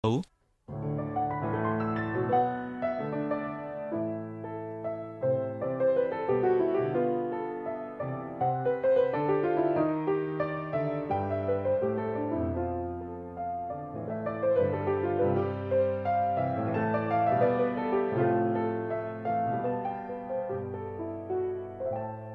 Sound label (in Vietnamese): nhac khong loi viet nam; VOV3